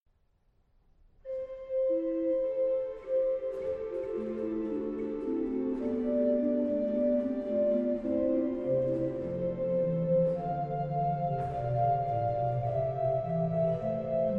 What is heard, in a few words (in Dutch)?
Orgelvers; Psalm 22